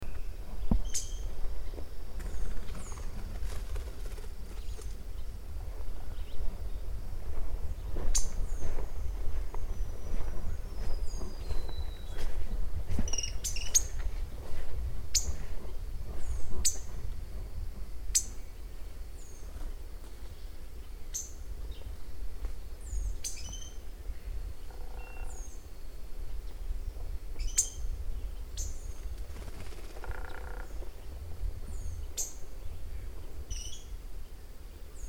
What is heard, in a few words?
Magpie tanager